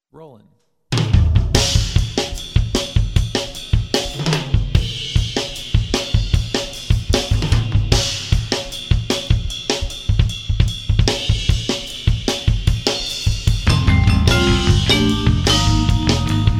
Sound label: Fanfare; House of Panorama